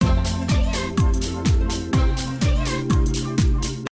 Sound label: bgm